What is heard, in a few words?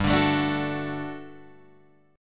G Major 7th Suspended 2nd, Guitar, Open G